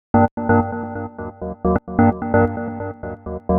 Gated Synthline (130BPM); creative gate; Loops; musicradar

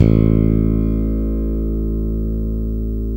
BS JAZZ, Jazz Basses; Roland L-CD701

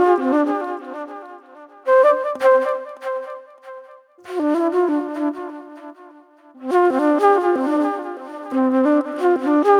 Acid Trip Flute; Sonic Foundry (Sony Creative Software); Manhattan Flutes; Smooth Groove

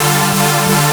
Trance Synth

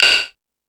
Crash, Drum Machines E-MU Pro-Cussion

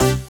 HOUSE, House Dance

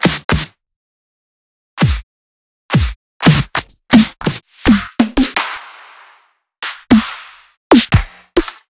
Snares & Claps

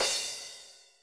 cymbal, Roland MC-909

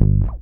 bass 4 (G); Sonic Foundry (Sony Creative Software); Ugly Remnants VOL-2; Basstuff

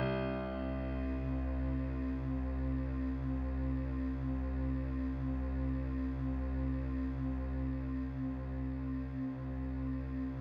C3, Synth Line; 90 s Sample CDs; NiceBeats; SynthLine VOL-32

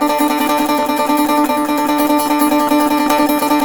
SAZ; Roland - LA Composer vol. 3

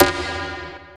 SYNTH SD, DANCE KIT

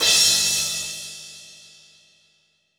Rock Crash, cymbals; Roland BK-5

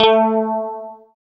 GUI, E-Guitar

